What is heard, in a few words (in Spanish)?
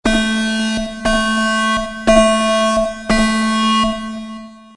alarma; five nights at freddys